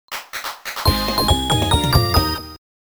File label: Stage Clear !; pop n bounce; arcade